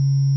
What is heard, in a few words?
zzzzz